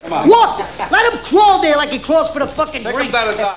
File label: crawl